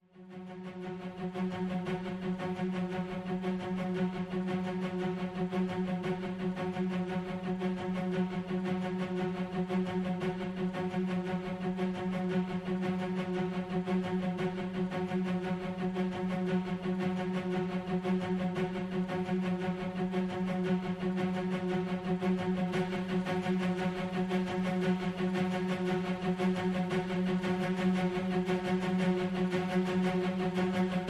Short Strings Only

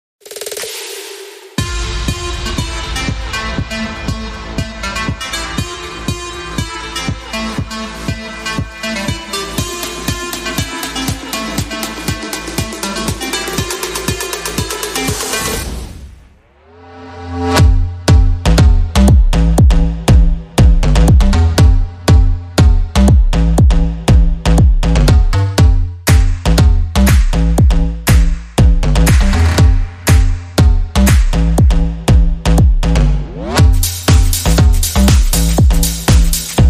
No Boxing SFX No Vocal Shouts Mix

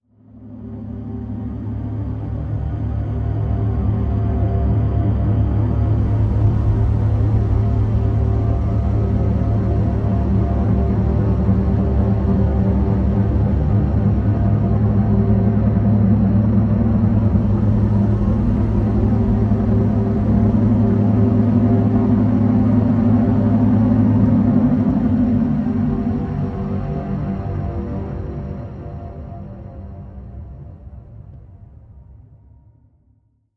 Drn Fathomage G

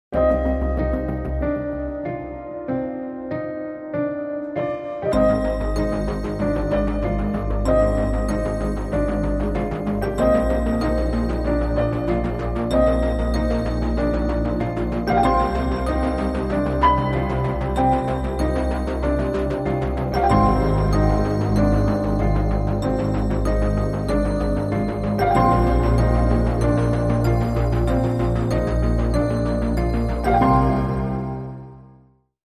No Perc

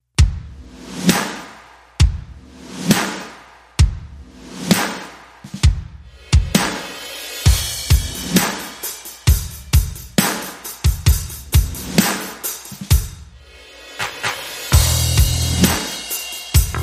Drums And Bass Only

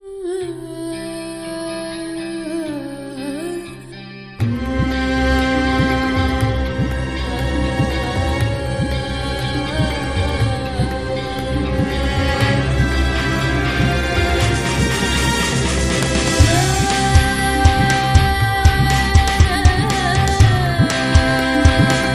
Ethnic Rock Epic

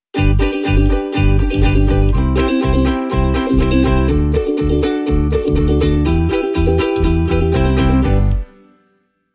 Stinger No Drums

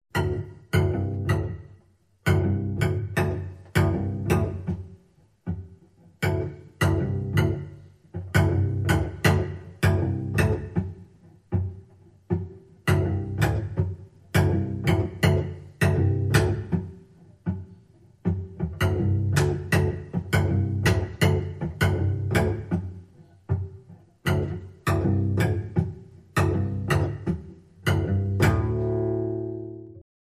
Cello Suite 2 Pizzicato Only

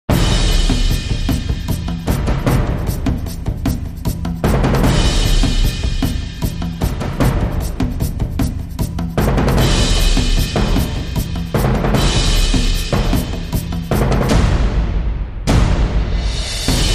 Epic Film Percussion

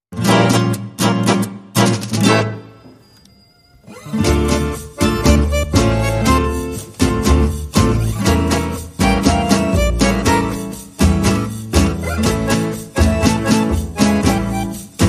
No Fiddle

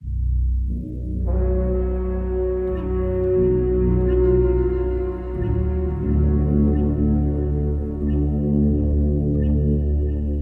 Self Doubt

